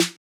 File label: Snare